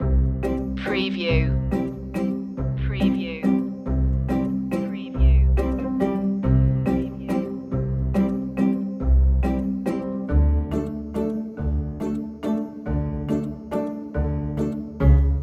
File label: waltz